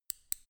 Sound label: scissors cut